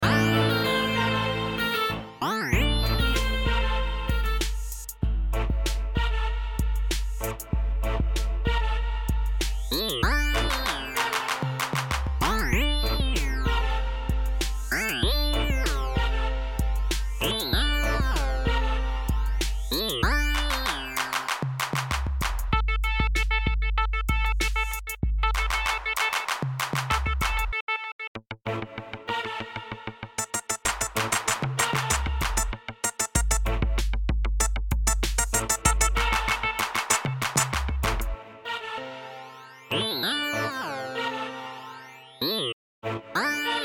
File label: Rap